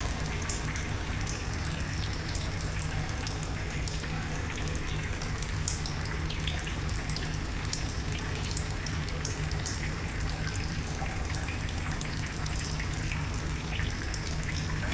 continuous trickling water in enclosed space

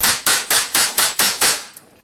three pneumatic nail gun shots; tools

